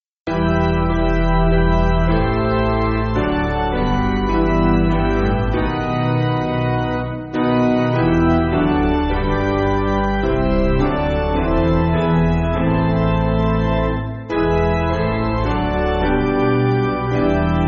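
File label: Piano, Music Backing Files for Churches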